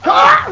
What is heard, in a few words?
huah; xeen; Creative Voice